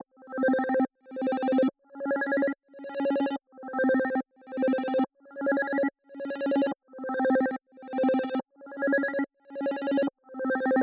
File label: 143 BPM C Min Arp; Stems; Cymatics; Cymatics - CHAOS Beta Pack (MIDI + Stems); Sucker Punch